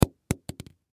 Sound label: Baseball Drop On Dugout Floor; SoundEffects-Blastwave; Sports